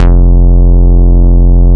Synth Bass, Roland

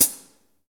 HH hh; Best Service Gigapack 2

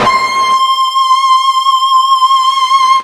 FUZZ C 5, ULTRA FUZZ, Guitar; East West; Steve Stevens Guitar Samples Collection

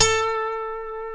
B funk, Guitars; Spectrasonics; Hans Zimmer Guitars VOL-1; TAKA FUNK

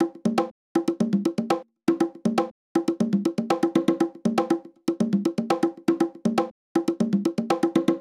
Conga, Beats, Nu Jazz House, 120; PowerFX

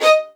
XLD2 D#5, LOUD VIOLINS; Q Up Arts; Denny Jaeger Master Violin Library

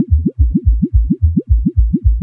Noise, LFO & Noise

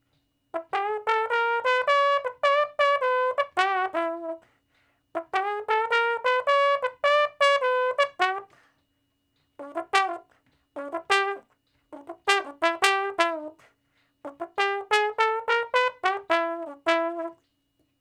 Trombone Shuffle (E); Crimson Blue and Fabulous Horncraft 4 RnB; Horncraft for R&B; Solos

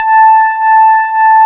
DX PIANO; East West; Twisted Textures-BT

